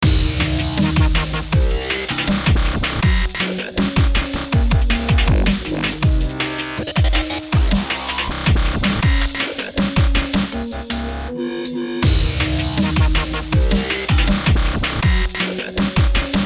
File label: Drum and Bass Glue